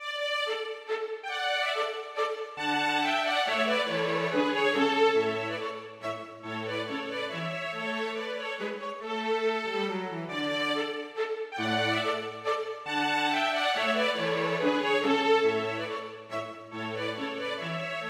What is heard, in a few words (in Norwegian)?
Menuet for to fioliner og cello; Aamodt